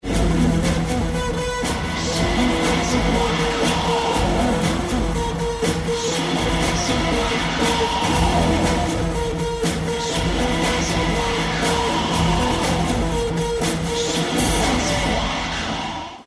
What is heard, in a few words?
Riff